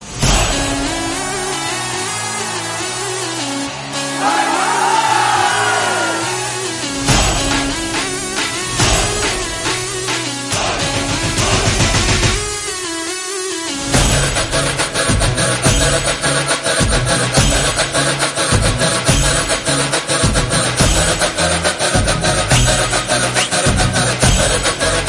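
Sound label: background music ringtone; Ram Leela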